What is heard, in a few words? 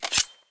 use; Escape from Tarkov